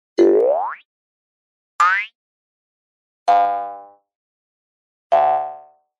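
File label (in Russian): звуки с пружинкой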